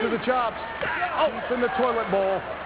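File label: toilet bowl; towletbowl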